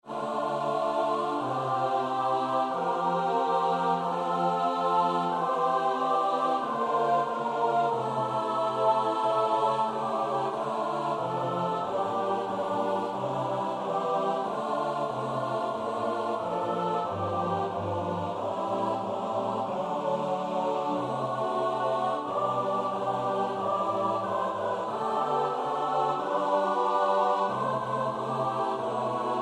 S-A-T-B, koorpartij oefening; Dubra (Rihards)

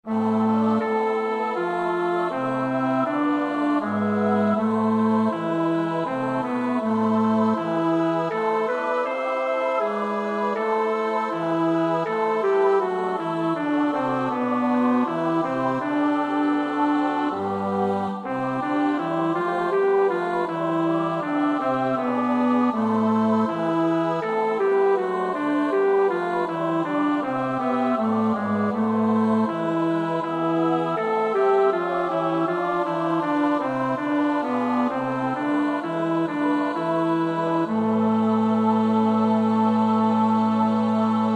bas; Bach (Johann Sebastiaan); BWV 074

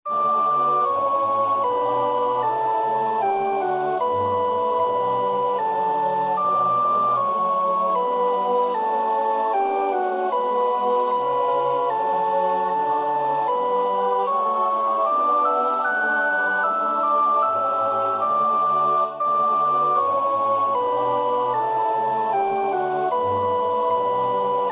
sopraan, koorpartij oefening; Bach (Johann Sebastiaan); BWV 174; Herzlich lieb hab ich dich, O Herr

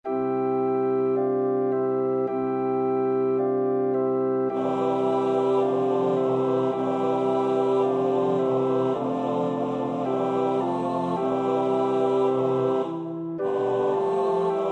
S-A-T-B, koorpartij oefening; Gounod (Charles); Messe breve nr. 7 in C

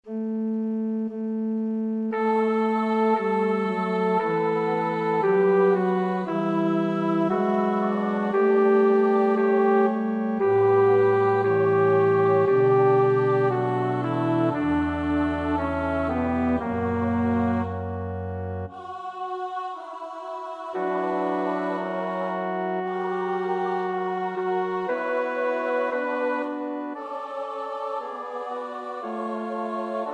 bas; Kodály (Zoltán); Pange lingua

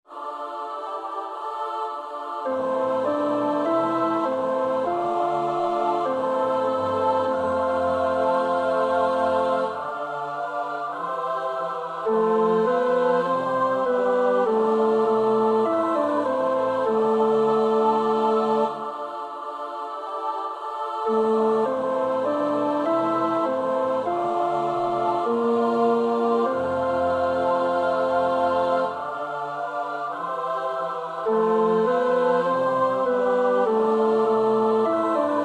tenor 1; Röntgen (Julius); 6 oude Nederlandsche liederen

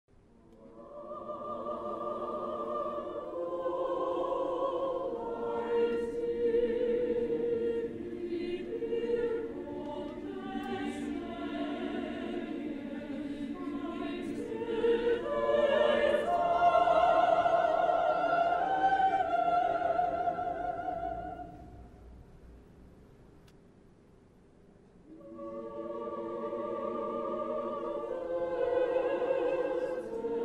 Koor Senta's ballade; Wagner (Richard)

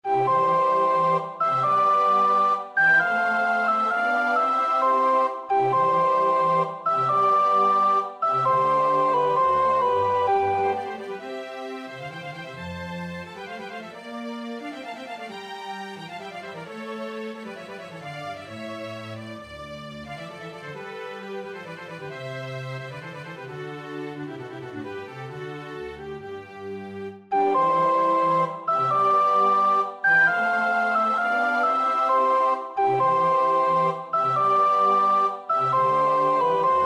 sopraan, koorpartij oefening; Purcell (Henry); King Arthur

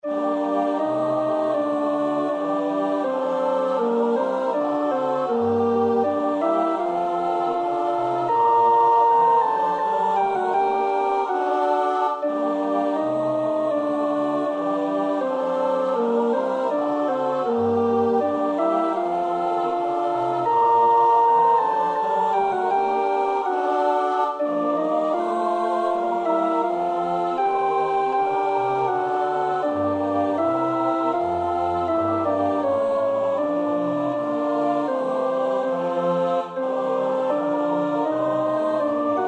alt, koorpartij oefening; Bach (Johann Sebastiaan); BWV 048

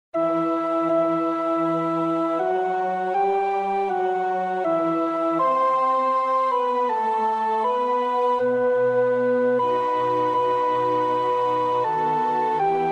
sopraan, koorpartij oefening; Schubert (Franz); Deutsche Messe (D872)

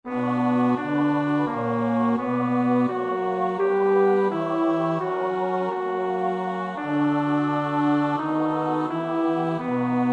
bas, koorpartij oefening; Wolf (Ernst Wilhelm); Jesu deime Passion

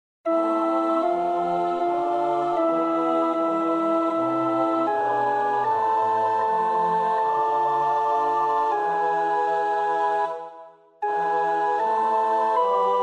alt, koorpartij oefening; Graun (Carl Heinrich); Der Tod Jesu

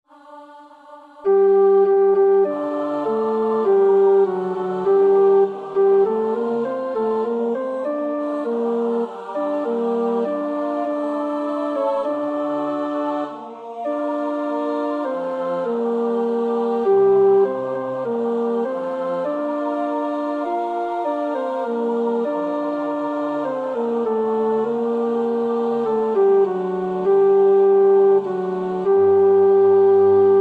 tenor, koorpartij oefening; Schütz (Heinrich); Matthäus Passion SWV 479

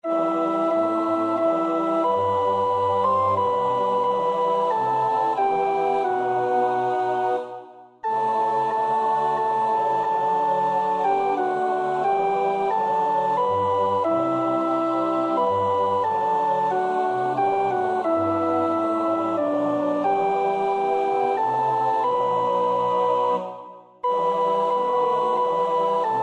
sopraan; Reger (Max); 7 geistliche Volkslieder

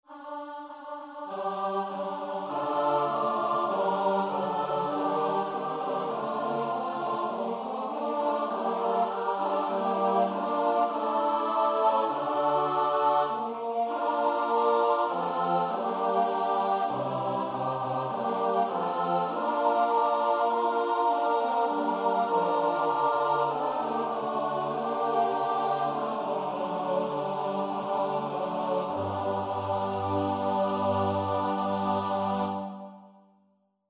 S-A-T-B, koorpartij oefening; Schütz (Heinrich); Matthäus Passion SWV 479

